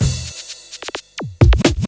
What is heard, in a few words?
Fill Ins 128BPM; Vengeance Samples